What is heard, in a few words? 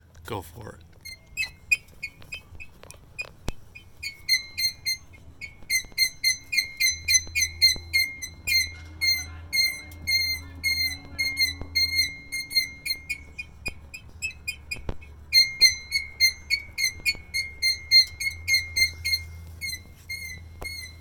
squeak; ford escape